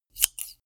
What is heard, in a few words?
scissors open close